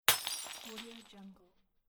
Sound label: tall wine glass smash; frontiersoundfx